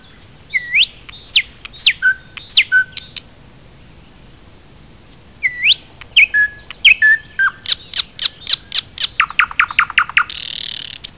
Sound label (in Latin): Luscinia luscinia